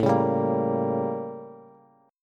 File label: A 7th Flat 9th Bass; A7b9 strummed; JGuitar